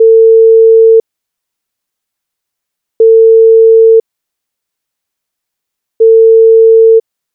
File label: Ring back tone; ZTE Axon 20 5G; calls